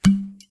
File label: bottle